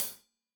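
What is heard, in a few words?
hat closed, hi-hat cymbals stereo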